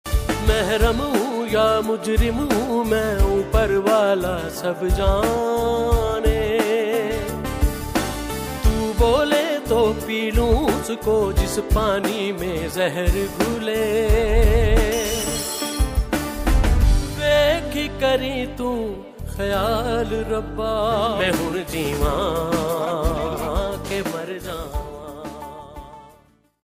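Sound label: original soundtrack pakistani ringtone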